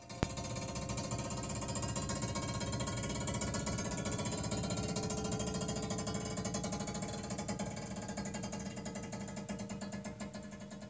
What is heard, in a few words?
AC Rattle Clank Noise; noise diagnosis